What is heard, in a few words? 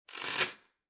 Jewelry Necklace Drop On Table, Foley, Jewelry, Necklace, Metal, Dropped On Wooden Table